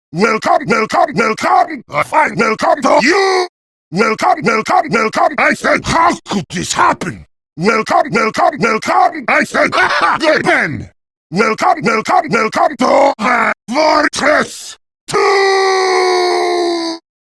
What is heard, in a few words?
(Acapella); Welcome to Hat Fortress 2